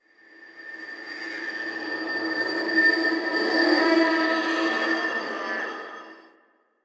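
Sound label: Glass Texture Medium, Ambience; Warframe; Cephalon Weave; DE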